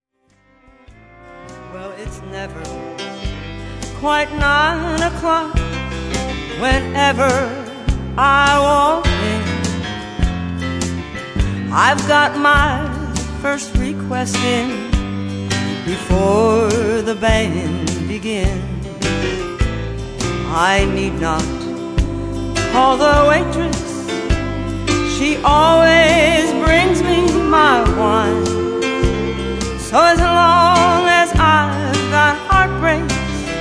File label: Slow Country Dancin